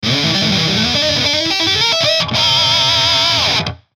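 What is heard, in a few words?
Musical Element, Drum Snare March; Blastwave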